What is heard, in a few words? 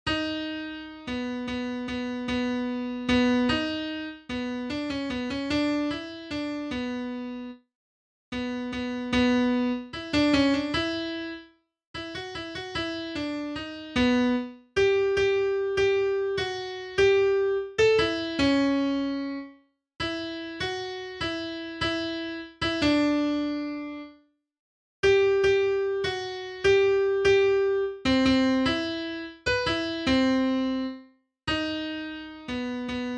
harmony